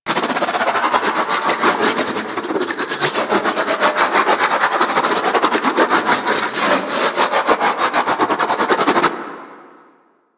Shotgun Glaive Loop; Weapons; Warframe; Tenno; Alchemist

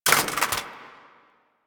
AK47 Reload Mag Drop; Warframe; Tenno; Weapons

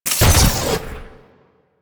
Mech Jump Boost Start; Nechro

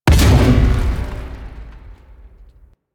Stomp Impact C; Warframe; Corpus; Jackal Redux; Abilities

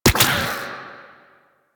Venom Burst; Warframe; Saryn

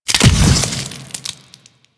Brain Cyst Explosion; Warframe; Infested Eris

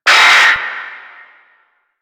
Screen Flicker; Warframe; Duviri; Easter Eggs

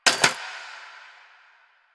Cafe Chair Short; Warframe; Ambience; Nineteen Ninety Nine

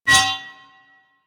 Melee Impact Metal; Warframe; Weapons

